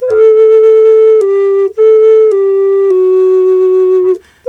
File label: D2 108, Didgeridoo